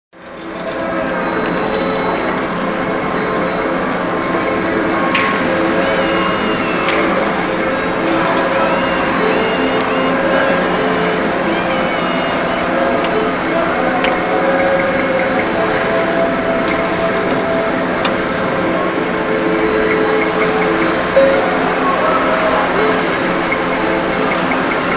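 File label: sounds of casino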